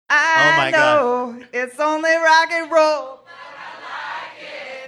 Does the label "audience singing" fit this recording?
Yes